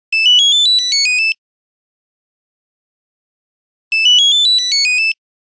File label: Happy return, Nokia Buzzer; Windows Phone apps